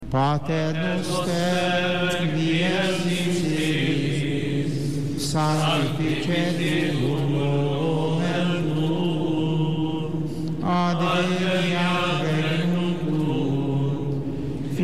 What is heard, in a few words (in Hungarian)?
E latin Miatyank; Enek; Szentmise